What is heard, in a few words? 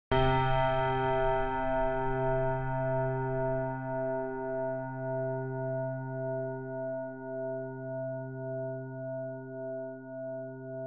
samples decay